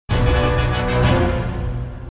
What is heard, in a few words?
Miniboss